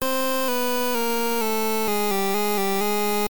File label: retro game fail tone; zapsplat